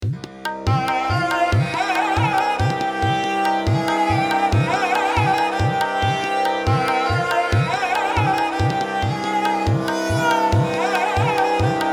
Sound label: Indian music